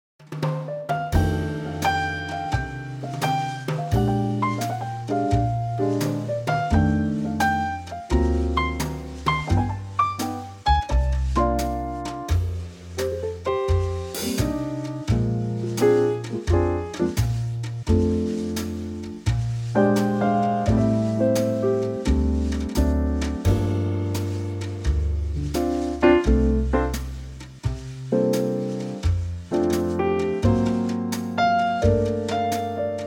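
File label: ballad F Trio, Backing Tracks for Male Vocals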